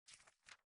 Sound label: paper